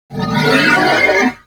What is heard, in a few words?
Horse Vocal Screech; Vehicles; Warframe; Duviri